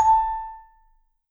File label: V SHRT MF A4, Marimba & Vibes; Sampleheads; Dave Samuels